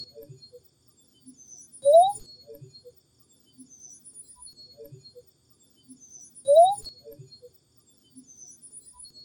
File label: hylarana luctuosa, mahogany frog